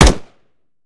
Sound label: mike4 fire, weapons; gmod; viper